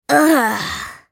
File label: Sad Mummy dying; Character Voices; English